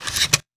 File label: weapon foley drop; Real Guns Sound Pack 2